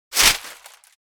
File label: Paper folding swish